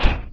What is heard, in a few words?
metal step, doom3 pinky